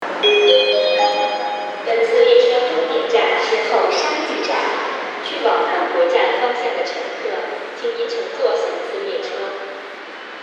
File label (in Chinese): 15号线 后沙峪 小交路 进站